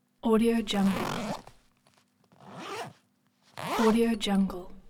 zipper

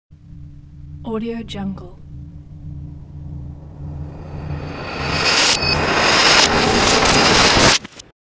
multiple reversed glass noises

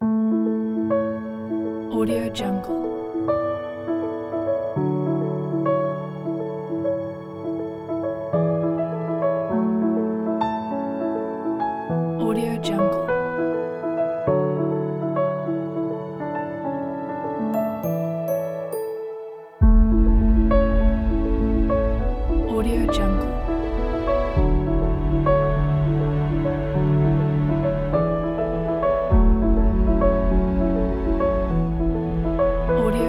positive cinematic pack

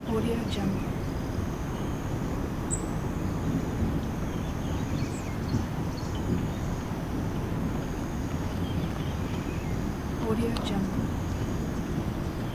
rainforest afternoon

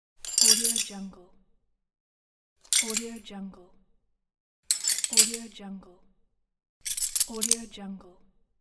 tool handling

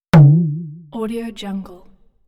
funny pulsating wiggle impact; hollywoodedge

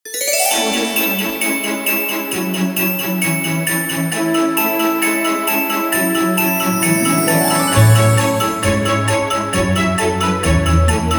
for christmas

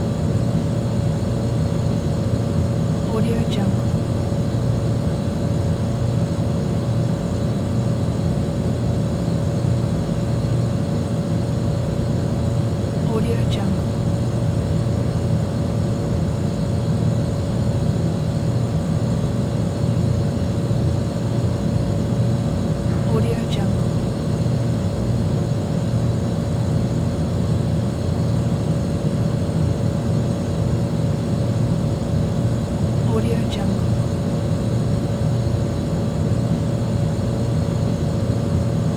amb room tone

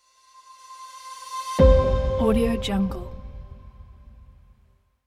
logo simple piano

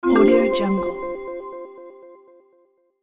secret area found

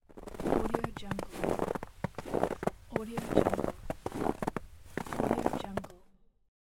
snow walk

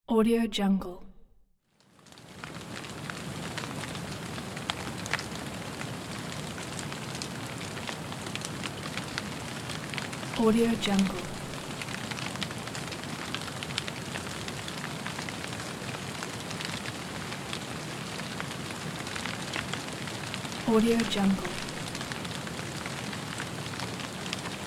soft rain on grass

